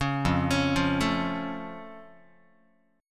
F Augmented F Guitar; arpeggiated

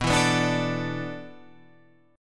E 7th Suspended 4th

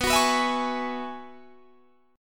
Gb 9th Suspended 4th B, chord

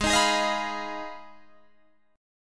Ab Minor 6th, Ab, Guitar, Open G

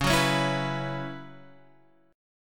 F sharp 7th Suspended 4th, guitar

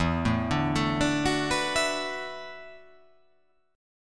E 9th Suspended 4th E down

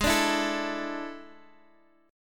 D Suspended 2nd Ab; strummed

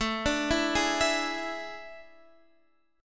A Major 7th Suspended 4th, Guitar Drop D; chord

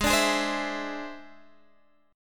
E Augmented 7th; chord shape

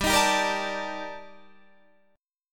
D sharp Major Sharp 11th, G

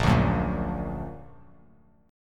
A sharp Augmented

